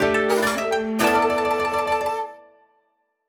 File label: Jingle Opening Japan; Clubhouse Games: 51 Worldwide Classics; Salon